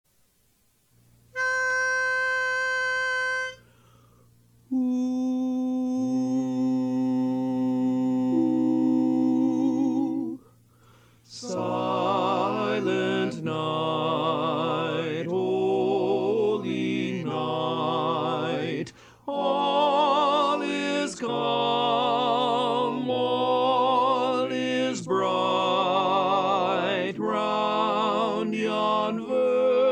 Lead

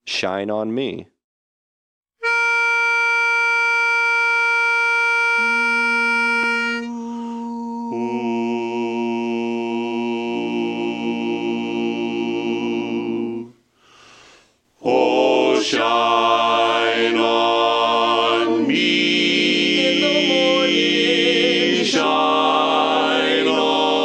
Bass

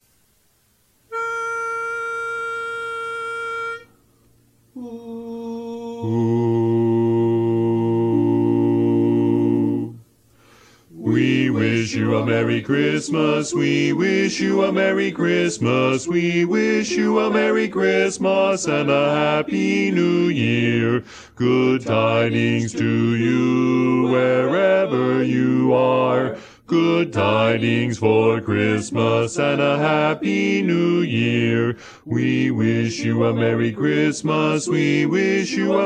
Bass

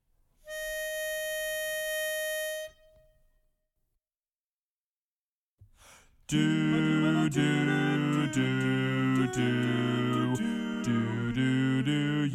Bass

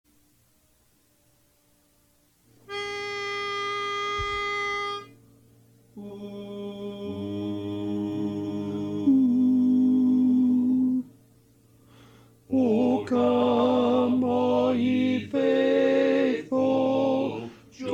Tenor

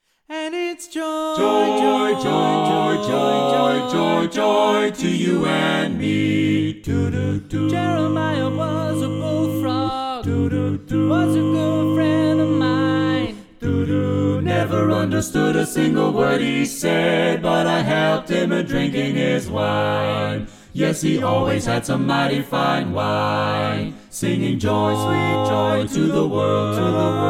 Bass

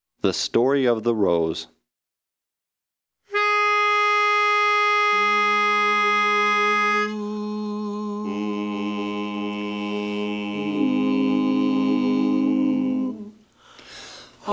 The Story Of The Rose Tenor

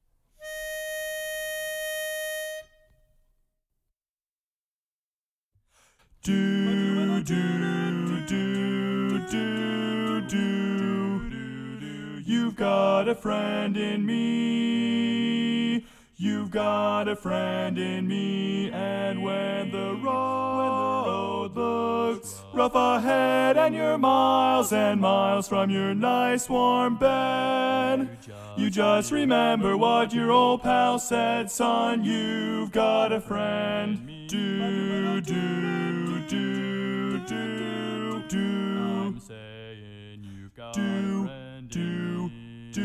Baritone

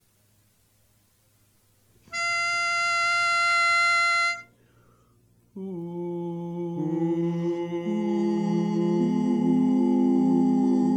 God Rest Ye Merry Gentlemen Bari

